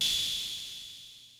MEGA KIT, DRUMS; Kid Nepro; Elite

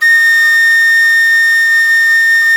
G#6, REED FLUTE; SoundBytes - Early Patches

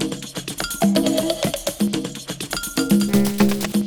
Conga, Percussion Loops

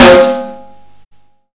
Snare Warm, Drum and Bass

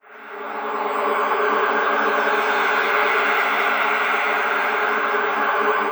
delay line; Sonic Foundry (Sony Creative Software); Ugly Remnants VOL-2; UR 2 FX (Long)

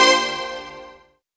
Dance Mega Synth; Best Service

